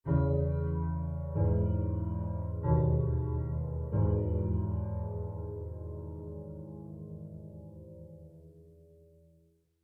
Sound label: Start Of Day; lethal company